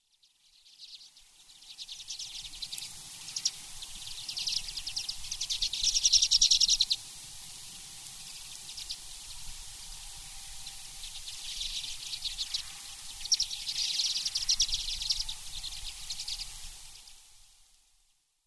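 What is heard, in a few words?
common redpoll; benihiwa; shari; koe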